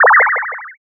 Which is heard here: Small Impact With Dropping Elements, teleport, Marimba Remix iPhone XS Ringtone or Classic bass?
teleport